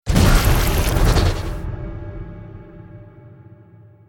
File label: Stinger Action Short